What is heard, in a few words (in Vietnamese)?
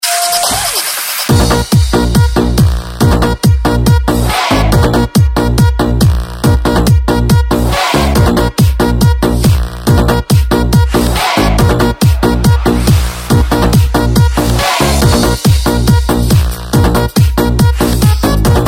nhac dj ruc lua